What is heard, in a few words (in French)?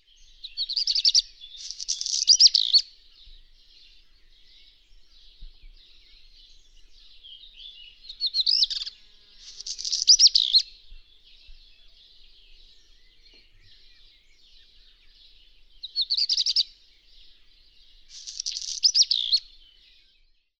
Rouge queue noir